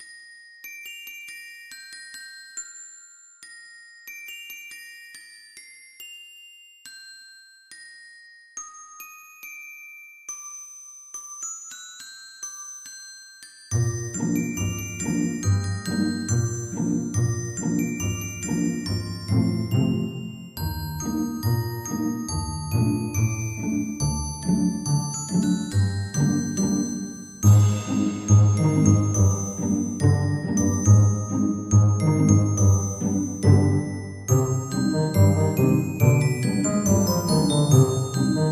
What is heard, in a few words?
Glockenspiel